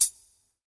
prominent hihat c sharp key, Royality free hi-hat sound tuned to the C# note. Loudest frequency: 11654Hz; hits one shots; hat samples